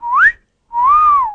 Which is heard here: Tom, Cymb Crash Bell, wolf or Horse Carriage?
wolf